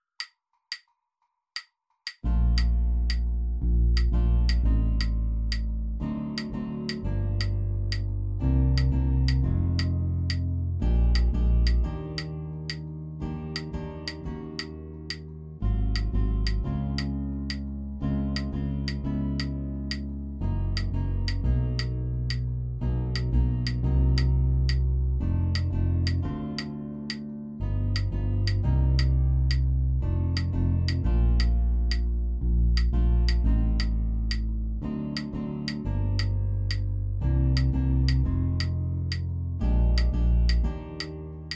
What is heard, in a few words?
playalong rhythm